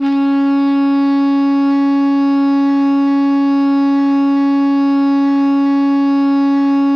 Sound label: BARI PP C#3, saxophones; Greytsounds - Philip Wolfe David Crozier's Saxophones